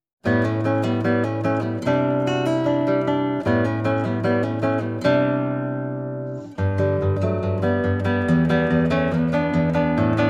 Lowden guitar

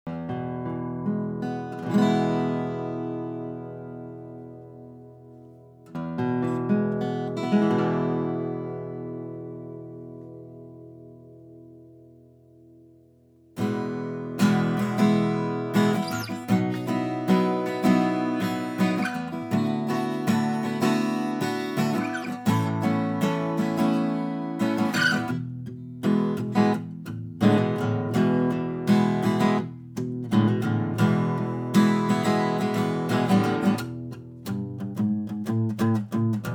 Rozawood 000 42

